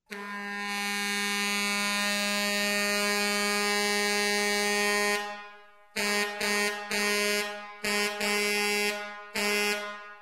Air Horn

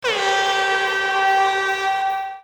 horn

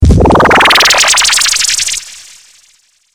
laser across water

